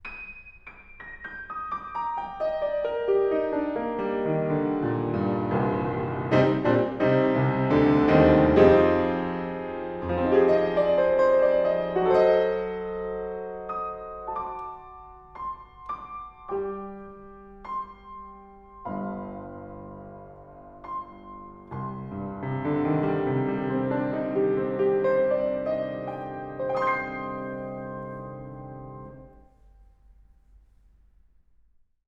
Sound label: Piano, Horiz Off Mic